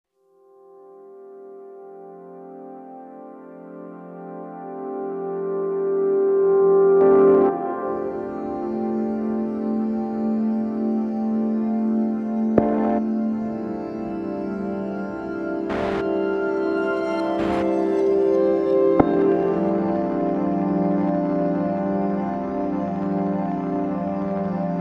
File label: Ambient mix Warm Low